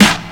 clean snare drum sound g sharp key; snare drum samples

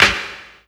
focused snare d sharp key; snare drum samples; one shots

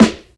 snare drum sample A key

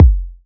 subby bass drum single hit F sharp key

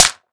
good clap A key; single hits one shots

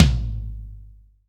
ambient tom a sharp key; tom drum

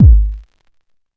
rich lows kick drum g sharp key

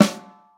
puffy steel snare drum g sharp key; snare drum one shots

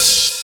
muted open high hat sound d key

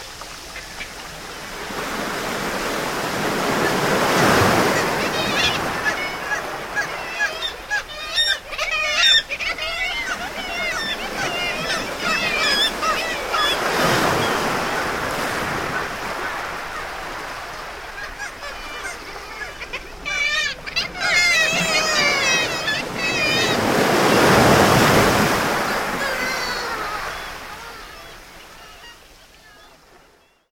waves and kittiwakes flying

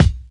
present kick one shot b key

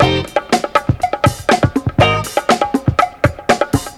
121 bpm modern dance drum loop f key

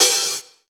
soft open hat sample f sharp key

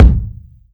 boom kick sample b key

